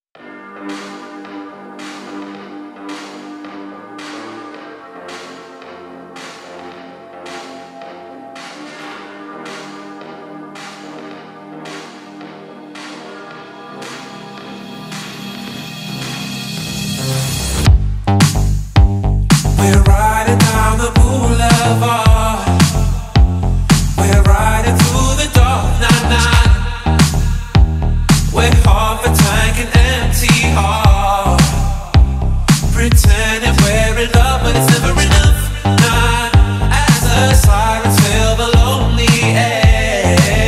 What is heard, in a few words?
slowed reverb